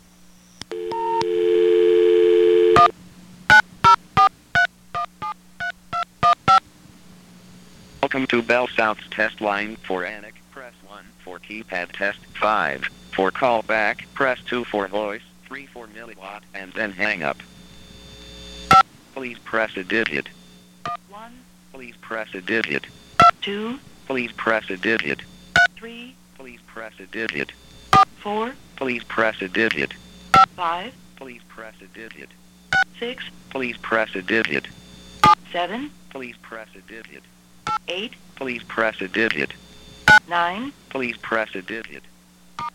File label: BellSouth Test Keypad; BellSouth Test Line (with Keypad Test)